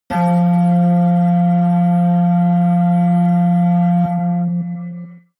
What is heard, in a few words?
metal organ high, hits/impacts